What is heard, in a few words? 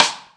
sd side, Yamaha TG-500